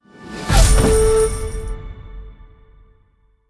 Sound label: chest Open; wild bazaar